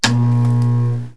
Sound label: monitor, office computer; KABOOM! - Wild and Wacky Sound Software